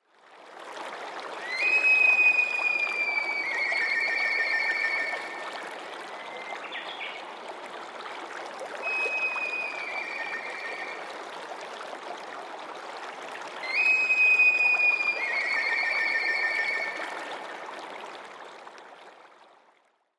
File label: tobi fue